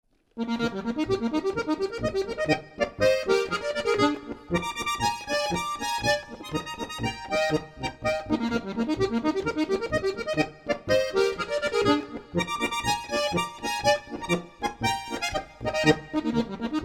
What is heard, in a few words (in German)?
Polka